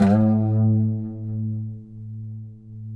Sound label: PLK OUD, Roland LA Composer